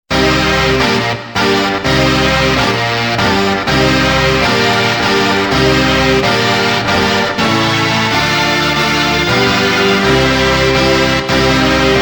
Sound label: combined brass